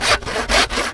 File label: Sawing Wood